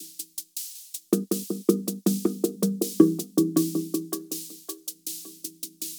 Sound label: drum loop, ambient, 160; eJay